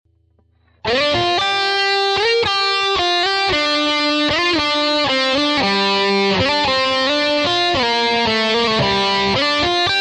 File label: Guitar